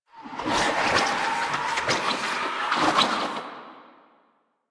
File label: Blade And Whip Idle Spin Whoosh; Weapons; Melee; Warframe; Dagath